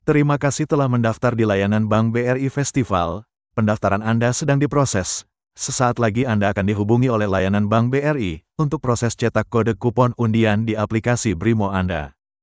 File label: music